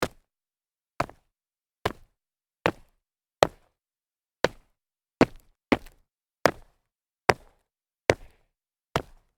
sneaker solid jumps concrete, foley

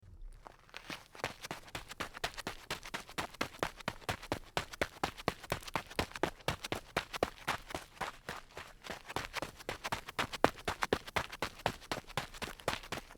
sneakers run light grit, foley

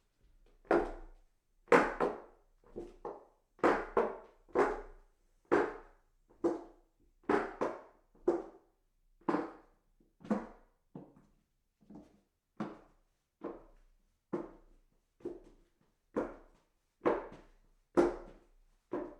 walk up hollow wood stairs, foley

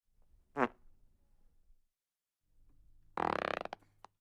fart toot

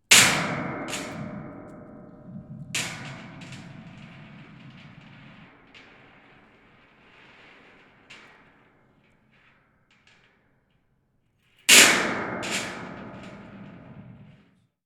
metal scrap rattling

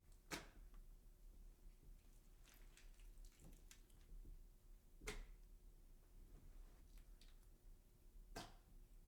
drop meat

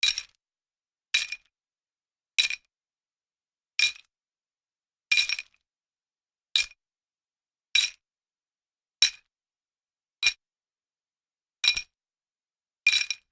chain jingle

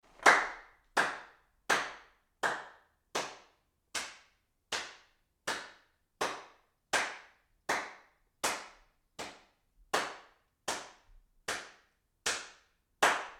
hand claps medium

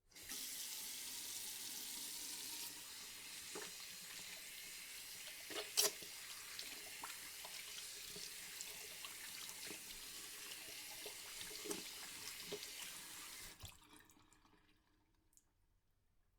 wash hands running water

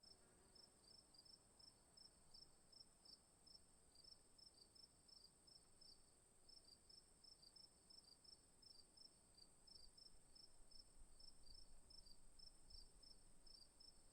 afternoon crickets long

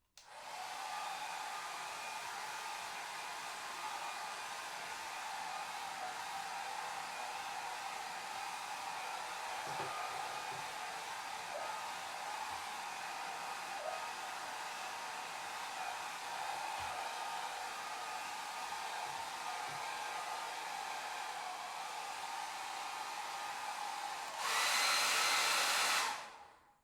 hairdryer

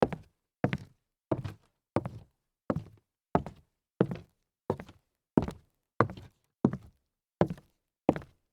walk on hollow wood

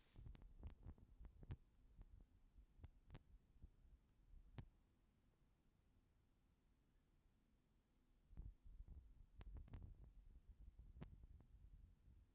wind whip

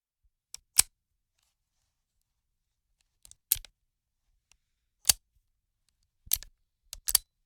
revolver cylinder click series

